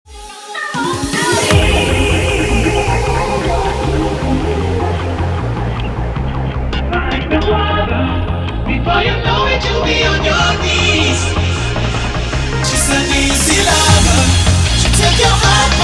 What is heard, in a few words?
Bounce